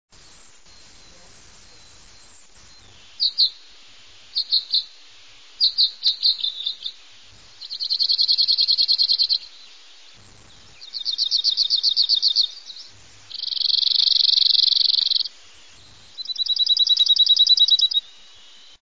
canto escribano soteño, aves campo

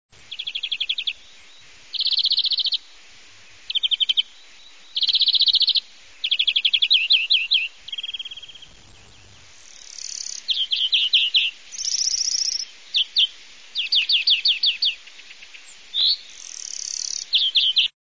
canto verderon comun; aves campo

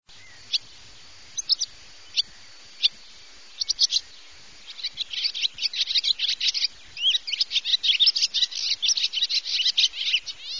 canto golondrina comun; aves campo

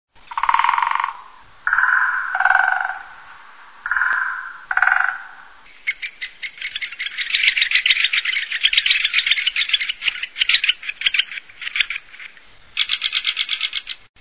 canto Pico Picapinos

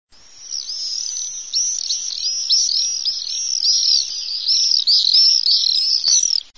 canto vencejo comun; aves campo

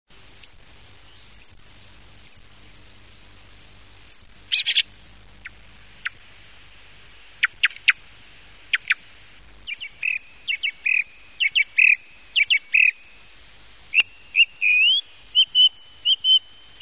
canto zorzal comun; aves campo

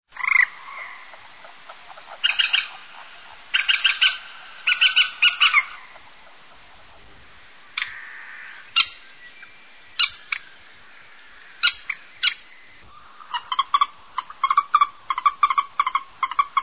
canto Polla De Agua

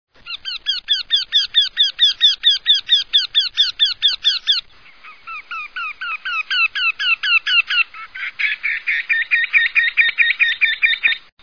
canto Torcecuello

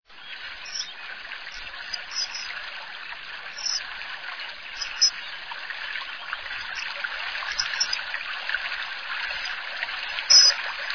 canto Martin Pescador

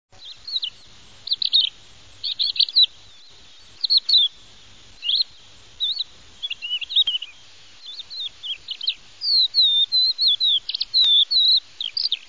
canto cogujada comun; aves campo

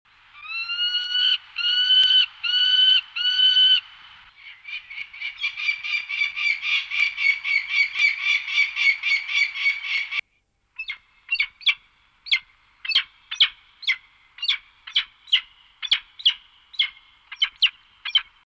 canto halcón peregrino, aves campo

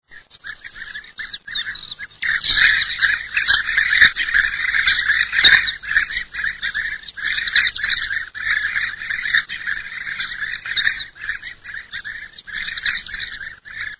canto Abejaruco Comun

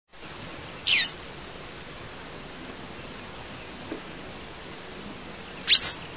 canto Lavandera Boyera

